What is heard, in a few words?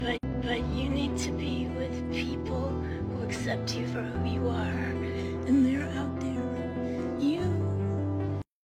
sad meme transition